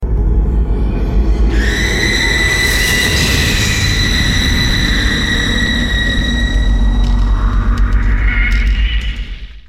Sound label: Scream